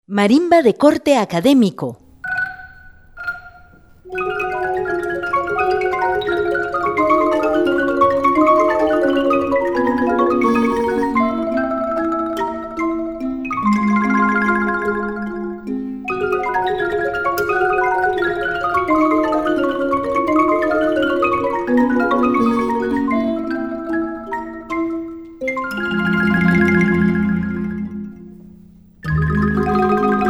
Marimba academica

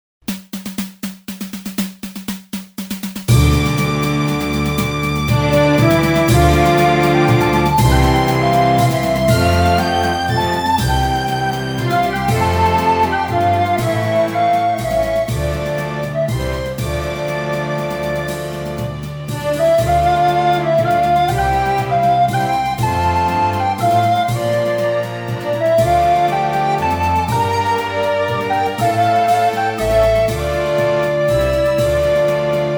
CON FLAUTAS; PERLA NEGRA

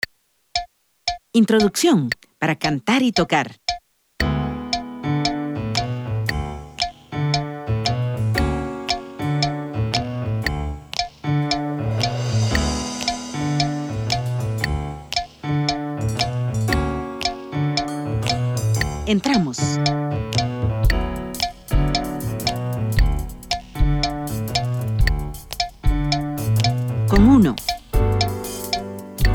LA MADRUGADA CLICK; La madrugada (Clicktrack) - tradicional puertorriqueña

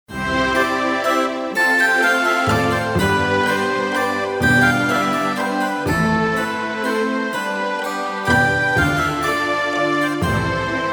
minueto pista

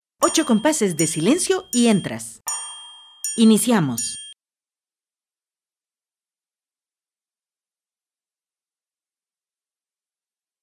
FANFARRA SEGUNDA VOZ

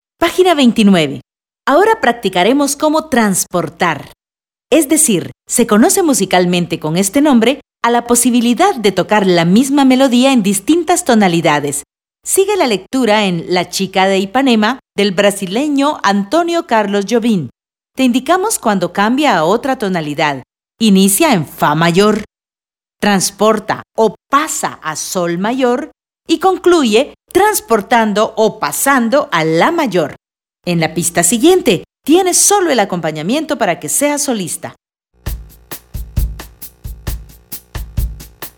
CON FLAUTA

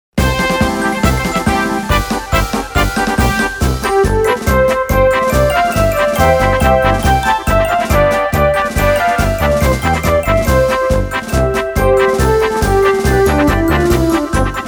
CAN CAN PISTA SIN FLAUTA; El can-can (Pista) - Jacques Offenbach

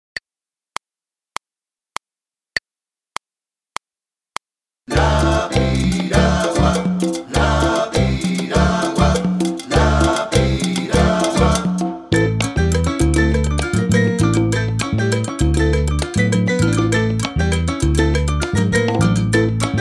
Pista sin Flautas

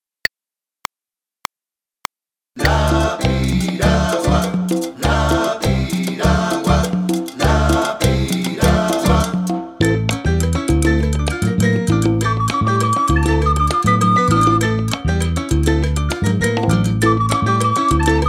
Segunda voz Flauta

